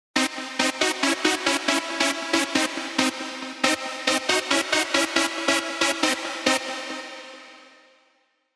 Stab, big room techno drops; Stab Forevaaa; Revealed Serum 2 Big Room Techno Drops Vol. 4

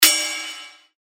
Ride, cymbals; revealed cymbals vol 1

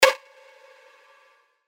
C, percussion; Flux; revealed percussion vol 2

